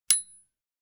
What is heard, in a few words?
BELL TYPEWRITER